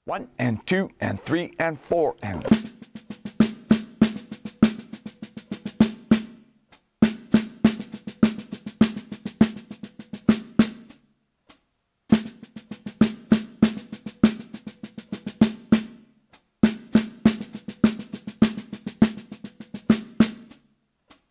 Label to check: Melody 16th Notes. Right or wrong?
Right